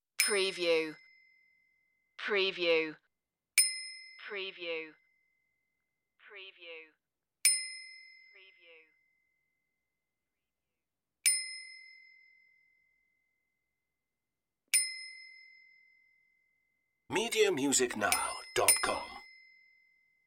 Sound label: bicycle bell